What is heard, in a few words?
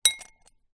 household teaspoon movement in bowl